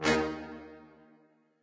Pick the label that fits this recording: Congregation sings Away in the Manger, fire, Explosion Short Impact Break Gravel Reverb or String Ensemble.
fire